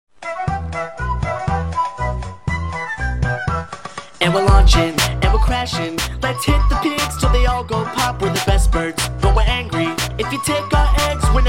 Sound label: Rap; The Angry Birds